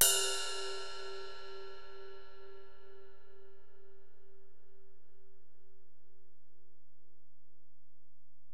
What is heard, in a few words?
Ride, Alesis DM-PRO